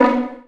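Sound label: Vio pizzicato B2; Digital Sound Factory Virtuoso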